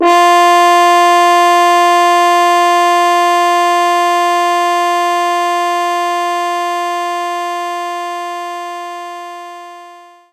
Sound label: French Horn F3